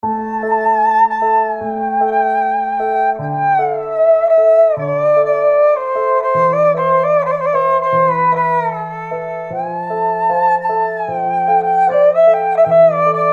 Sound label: dark beautiful erhu